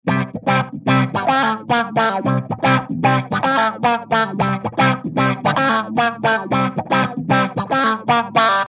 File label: Catchy Mutron